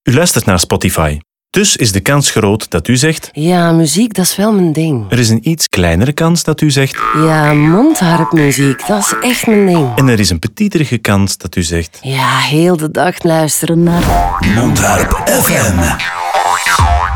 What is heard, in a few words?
mondharp; spotify